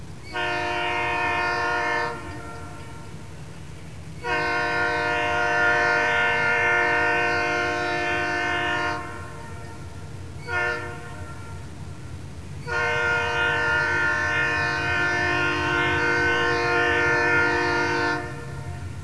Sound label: Diesel; gbw